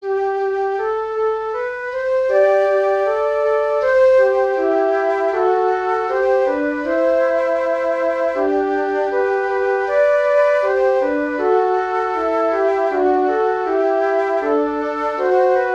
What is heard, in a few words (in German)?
Duett; Duett VI